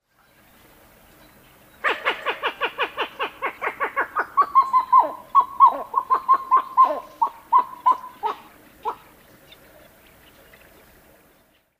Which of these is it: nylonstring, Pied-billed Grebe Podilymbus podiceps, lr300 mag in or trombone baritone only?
Pied-billed Grebe Podilymbus podiceps